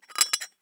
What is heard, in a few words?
Hole Saw Scrape; Hole Saw Bits